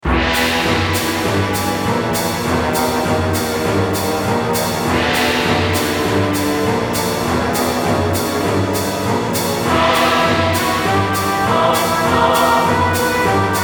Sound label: Canyon Medium; Serious Sam 2; Kleer